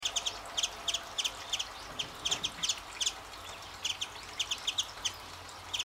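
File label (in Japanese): mejiro, 野鳥の声; メジロ; 身体も声もとても小さいですが、綺麗で愛らしい野鳥です。; 目の周りが白いですね。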